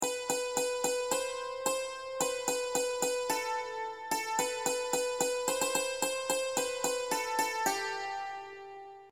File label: melody, banjo; 5 string; boil cabbage